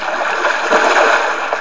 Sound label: WATER